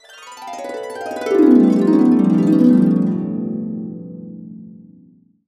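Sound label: A CASCADE DOWN, Harps; USB Soundscan vol.62; AKAI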